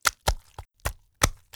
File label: fish slap deep; Lewd SFX